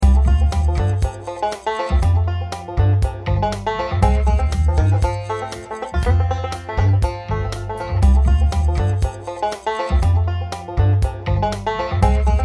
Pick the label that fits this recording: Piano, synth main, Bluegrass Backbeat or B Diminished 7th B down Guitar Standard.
Bluegrass Backbeat